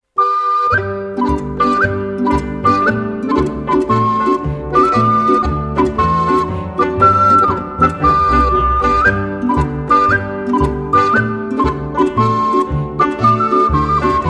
Okarina Boarischer

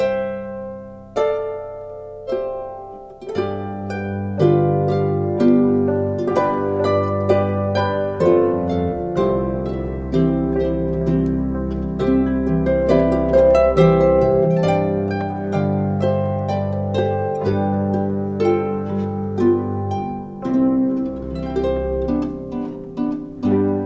Weis

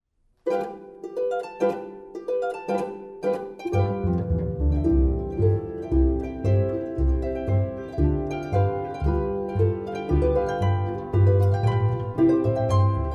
harfenpolka

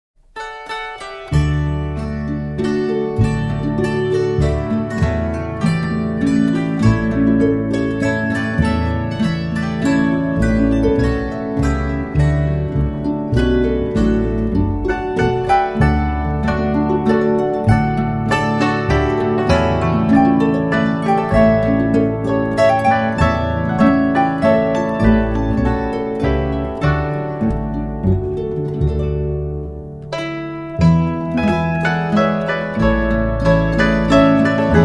Weihnachts Landler

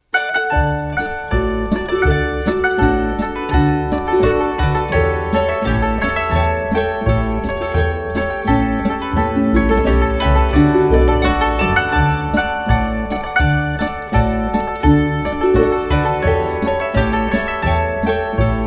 Hackbrett Boarischer

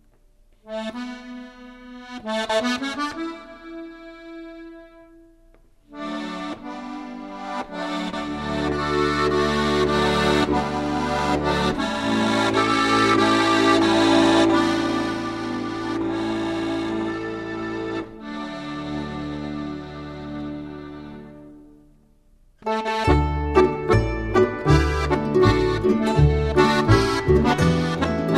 Boarischer

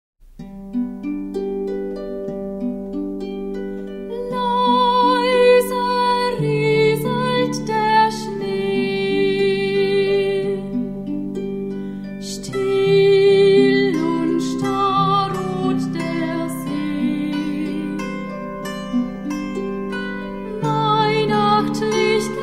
weihnachtsweisen

